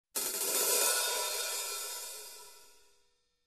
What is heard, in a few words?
Cymbal Swell